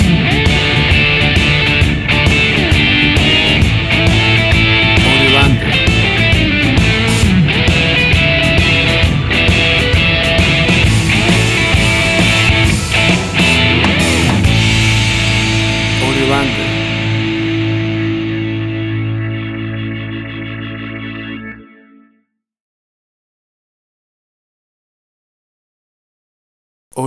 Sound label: Blues From the Deep South; Classic American Blues from the d